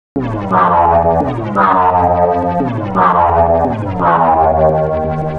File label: DNB Lead Darkness